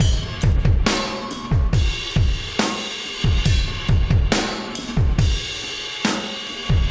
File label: Acoustic Drumloop; Drums & Percussion